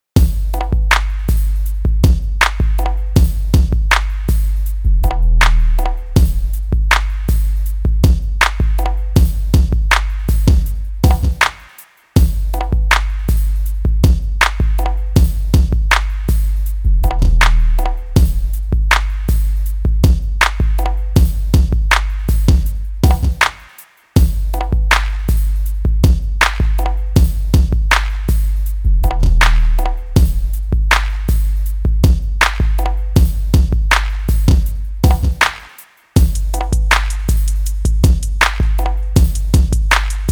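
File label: Street Encounters Drums Bass, 80 bpm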